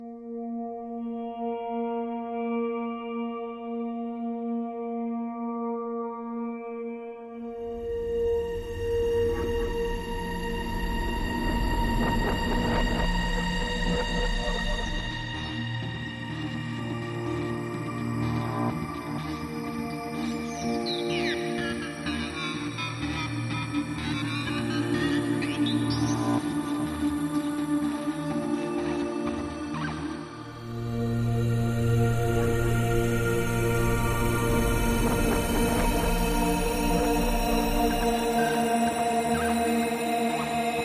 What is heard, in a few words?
Drone